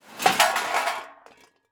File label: empty can drop